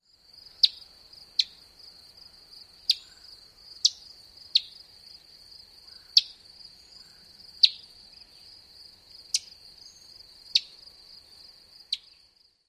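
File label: alarm calls, bird; KY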